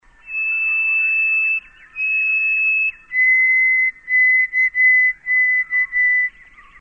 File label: white-throated sparrow slow